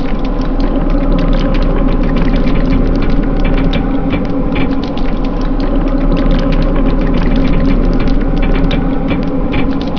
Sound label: boiling lava; quake2; kitsune